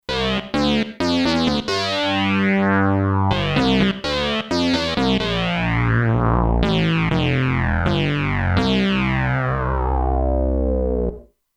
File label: Prophet Sync, Kurzweil K2500X; Factory Programs